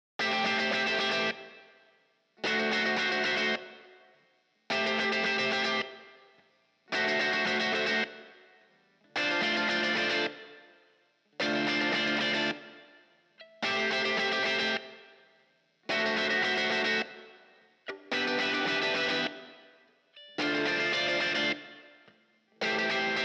side guitar; masaakiwada

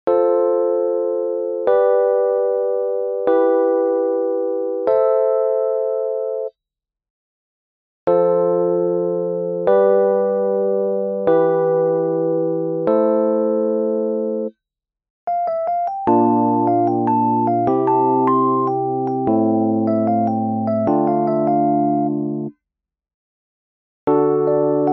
IV V III VI; masaakiwada; Theory

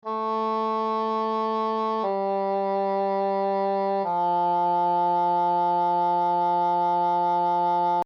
EH resonance

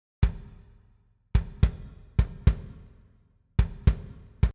kick comp; masaakiwada

